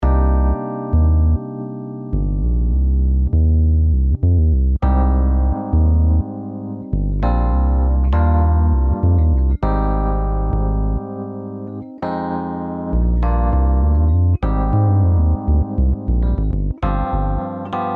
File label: chill pop songs